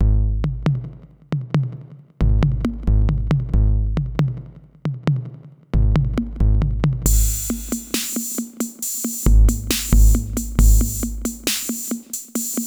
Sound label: beat